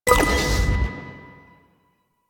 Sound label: Hack Minigame Click B